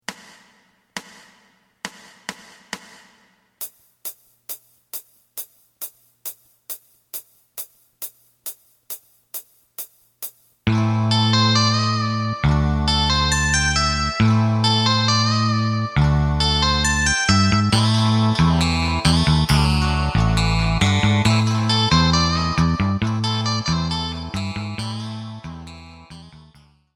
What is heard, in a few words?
drum